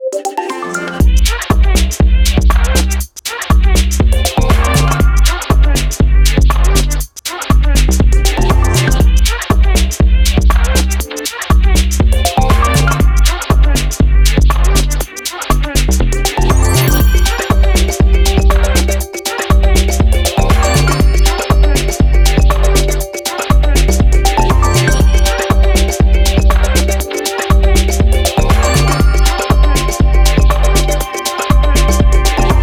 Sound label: Amber beats, ringtones; Google; Google Sounds; Gems